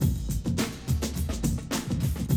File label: Classic DrumLoops